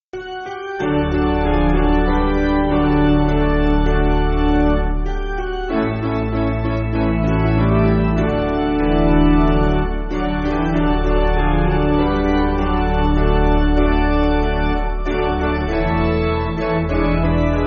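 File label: Piano, Music Backing; Daniel In Slavery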